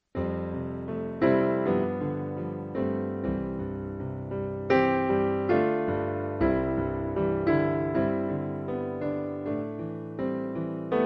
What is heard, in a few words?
piano only